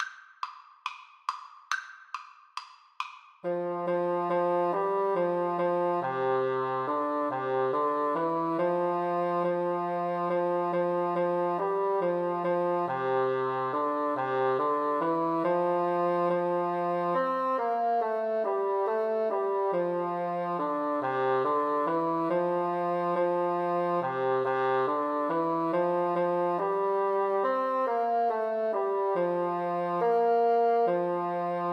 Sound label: Bassoon Duet